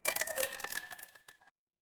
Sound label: glass crack